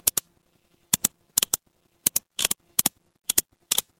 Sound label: office mouse click